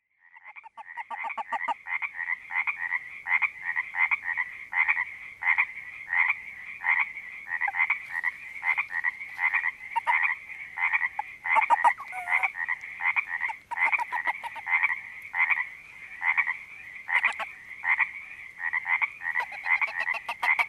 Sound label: Panoche Valley CA Creek Frogs